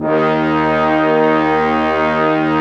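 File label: brass section, large ensemble long; Roland L-CD702; Orch.Ensembl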